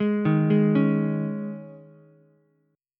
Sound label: Eb 7th Suspended 4th Eb, Ukulele; arpeggiated; chord